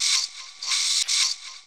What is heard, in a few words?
FEAR FX; X-Static Goldmine 2